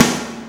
Snare, Fairlight CMI III

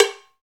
CowBells

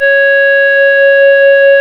C#, Synclav FM, WIND; Big Fish Audio

